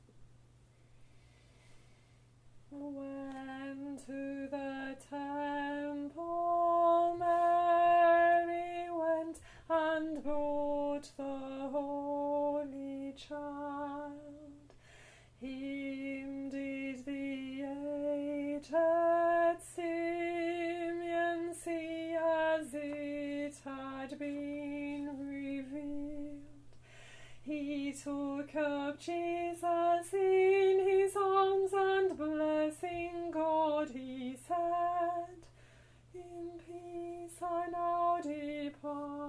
When to the temple Alto; Eccard

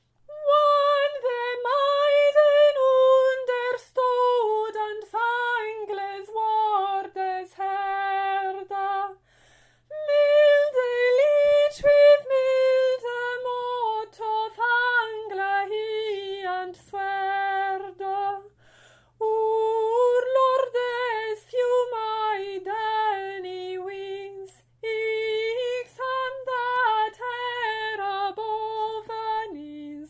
soprano 3rd verse

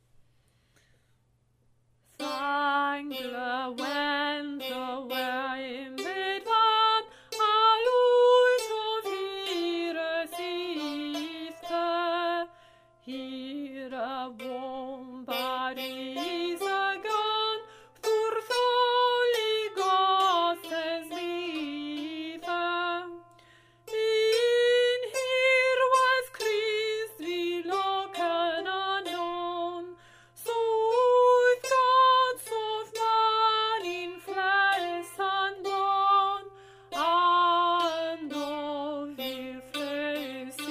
soprano 4th verse

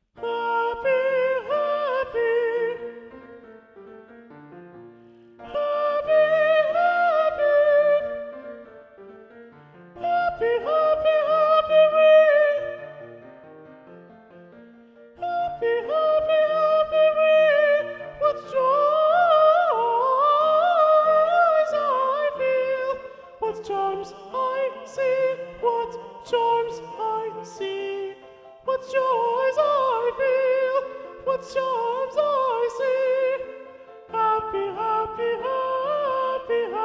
Soprano Predominant; Happy We; Acis and Galatea; Handel

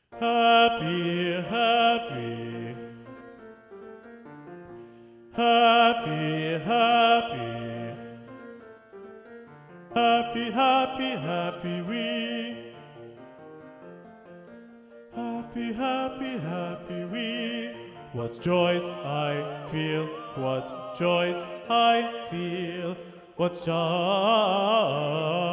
Happy We Bass Predominant; Acis and Galatea; Handel

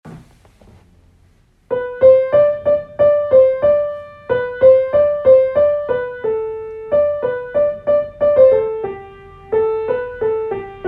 On this day piano; citysings

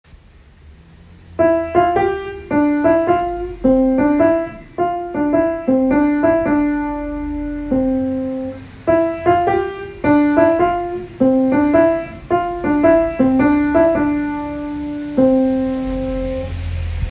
Hoc Chorus piano; citysings

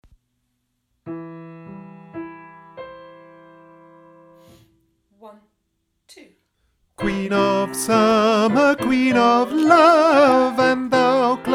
tenor part

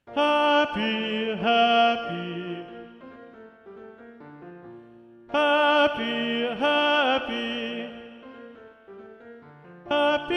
Tenor Predominant; Happy We; Acis and Galatea; Handel

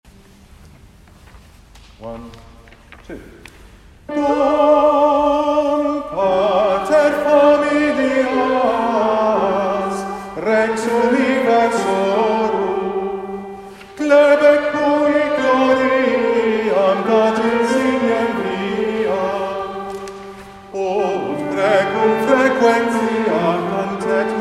Canto ultreia altos with words; citysings

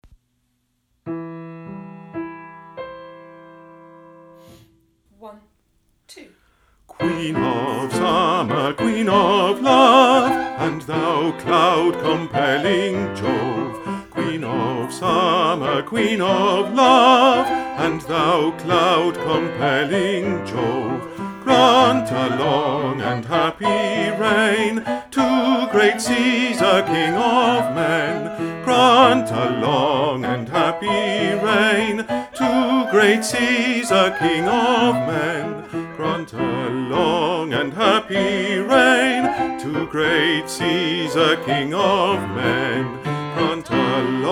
SATB bass part

